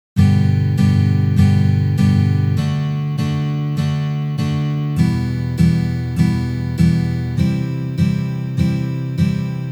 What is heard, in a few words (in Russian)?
Uprazhneniya dlya igry akkorda Sol mazhor na gitare